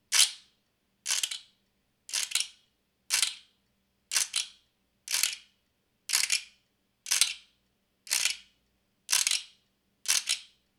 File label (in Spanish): Quijada, jawbone; Peru